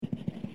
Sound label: step; dungeonmaster